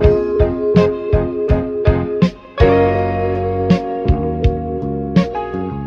keys, phonky loops

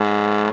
Horns

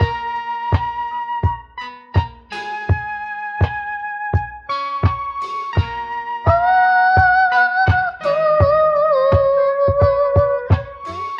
voice ahh yoo, loops, phonky

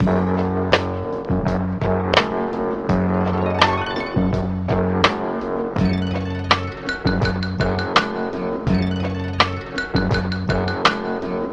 full vibes, Loops Phonky

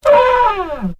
horn roaring

